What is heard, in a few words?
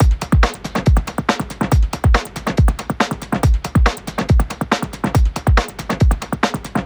Break Beats, 140 BPM